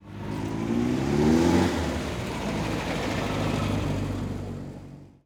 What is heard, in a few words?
dust car driveby; ambient atmosphere; csgo; Counter Strike Model Pack